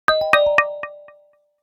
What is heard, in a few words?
News, notifications; Realme 8